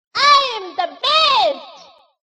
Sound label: sleep; toad